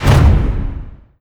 short whoosh; ui

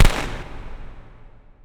firecrackers; dota

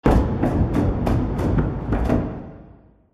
drums

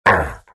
pain, vo; arc warden

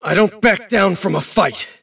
move pain; davion; dota

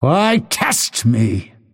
lasthit pain; dota; phantom assassin

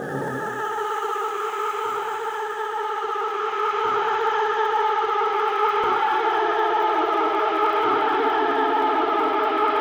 spirits loop; wisp; muerta

